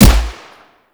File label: Grakata Shot, Weapons; Warframe; Void Trader